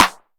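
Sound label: TR909 Snare Clap, Roland SH-32 Drumset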